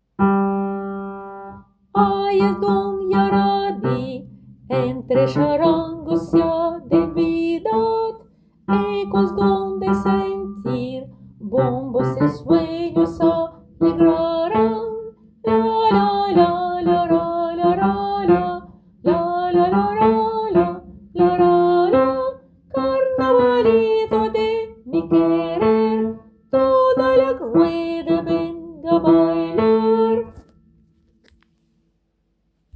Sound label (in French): Carnavalito hommes